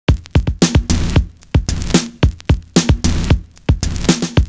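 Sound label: FINE bass drum, LOOP; Univers Sons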